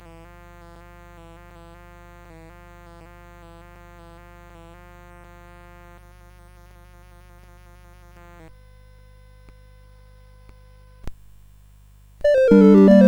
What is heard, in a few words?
Gigue; CSG8580R5